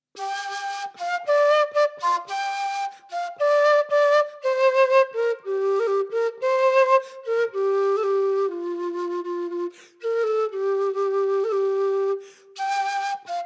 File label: quena